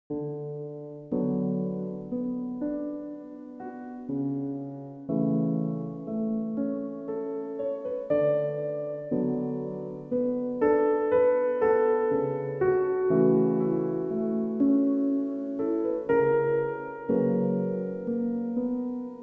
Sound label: Piano